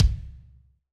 Kick; Reference beat bpm95; urban grooves; Rock

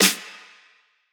Electro snare; Reference Beat

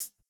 Jrock hihat

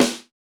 snare samples, Rock

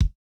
no effect j city pop 1980 kick

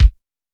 kick; Reference Beat BPM-130; Pophits

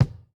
kick, house

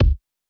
Hiphop kick; Reverb+EQ+Comp+Limit

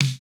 no effect j city pop 1980 snare

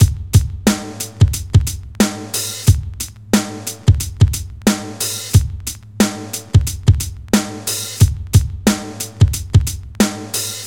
Rock loop; Reference Beat

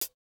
hihat, house

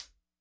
hihat, Acoustic

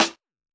hiphop snare, Effect on

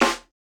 snare, snare house

